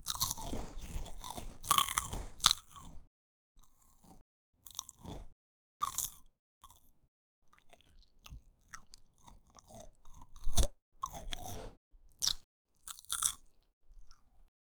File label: light crunch and gentle c